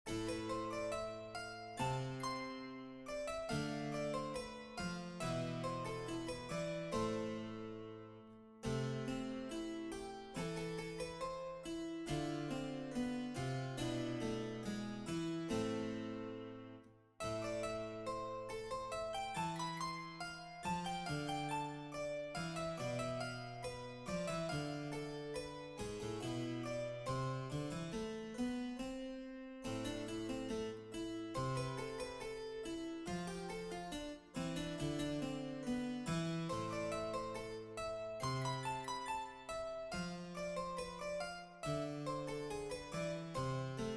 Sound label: for solo harpsichord Fugue; The Sun Dappled Forest; Fugue #3